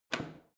washing machine creaky button press in

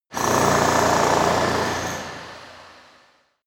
construction site stone drill single

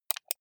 computer mouse right click

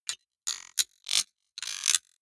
sci-fi electromechanics small stutter metallic

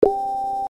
alarm

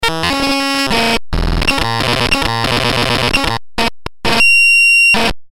code digital hard

